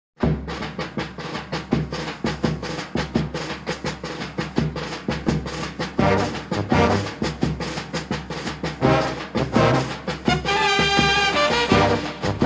serbian trumpet

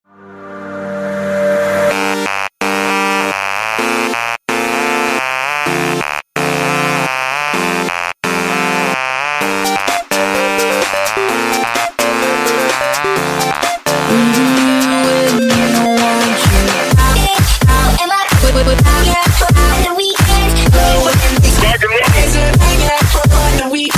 edm mashup

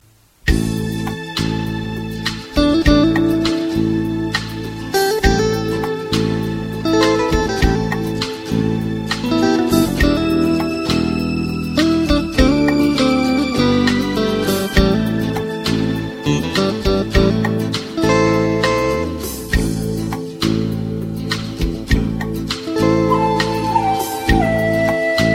relax instrumental

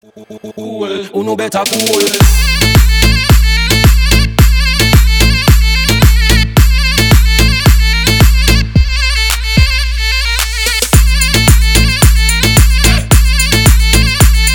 turkish dance

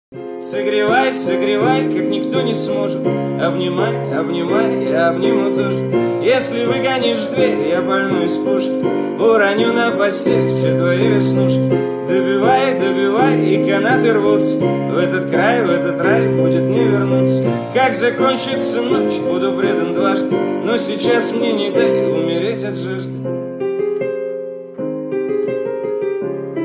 piano version

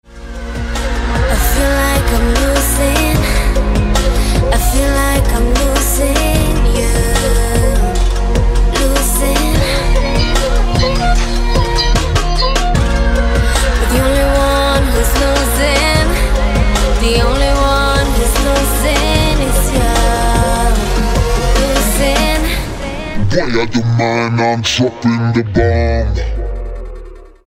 remix

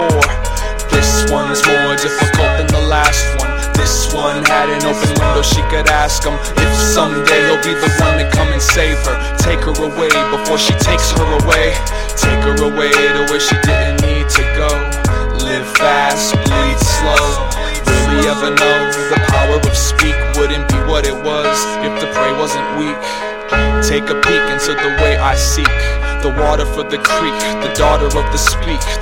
atmosphere bleed slow

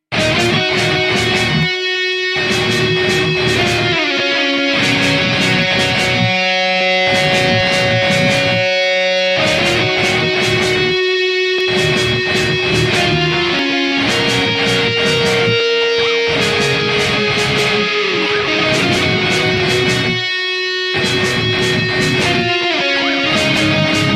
heavy metal; terminator

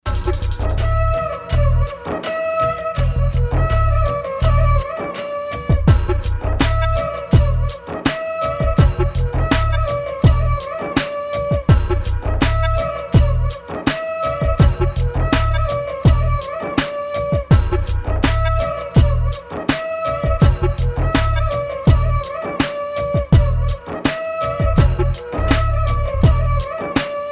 indian beat

